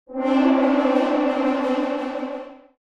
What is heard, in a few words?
Cartoon french horn trill